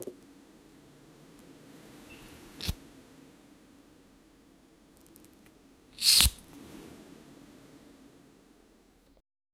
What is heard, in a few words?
a flower that opens gentl